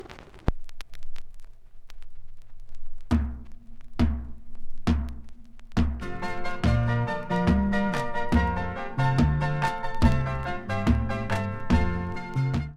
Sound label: clean music